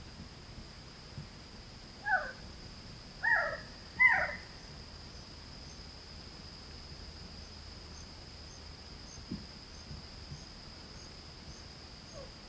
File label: Unknown bird